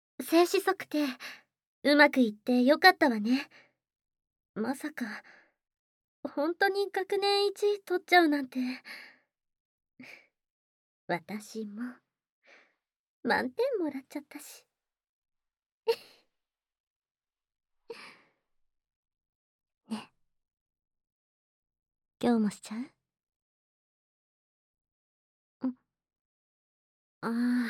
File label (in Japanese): 膝枕と耳かき, ASMR; 試験合格のご褒美は